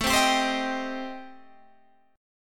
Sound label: A Minor 7th Flat 5th A, Guitar, Standard; Am7b5 strummed; 17,15,17,17,16,15